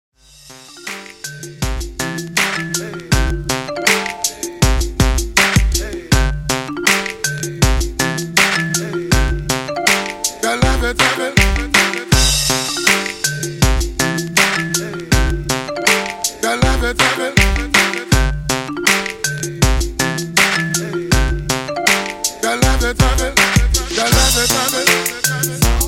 Blend Hip Hop 160Bpm

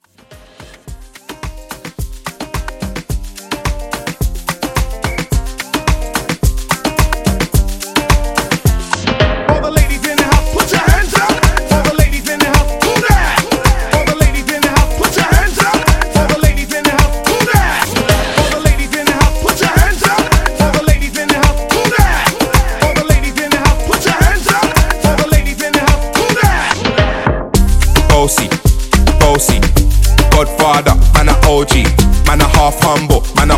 Moomba Hype Reboot

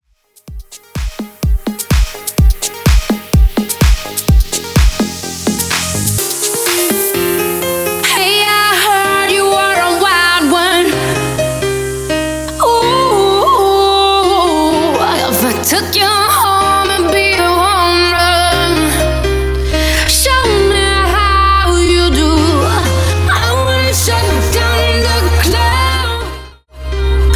Re Drum Clean 128 bpm

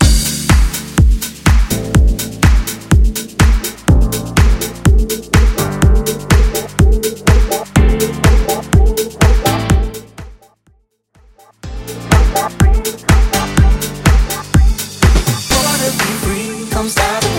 Transition Re Drum; 2019 Mainstream VIP Transition Tool; Vegas Edit

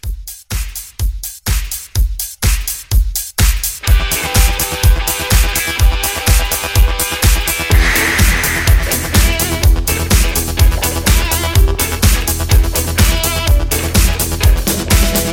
80s Classic Rock Re Drum 125

